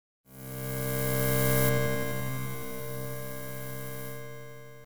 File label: cyber Scape, cyberspace; Dystopia